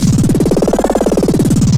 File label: Rare Roll, Special FX; Zero-G Jungle Warfare 1, 2 & 3